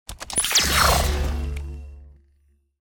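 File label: pickup, sword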